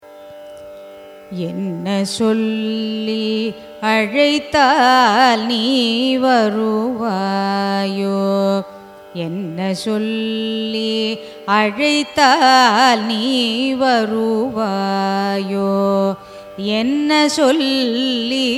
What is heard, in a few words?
raga kanada carnatic; carnatic music